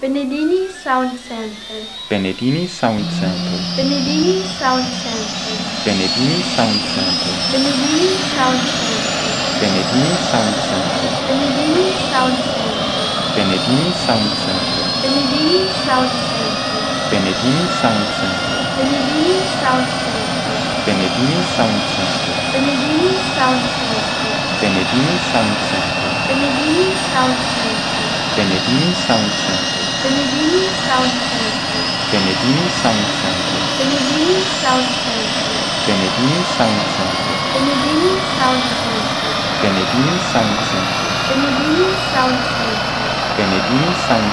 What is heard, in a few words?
Bo105